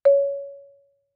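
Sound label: Marimba yarn fortissimo Db4; MIS Pitches - 2014; Percussion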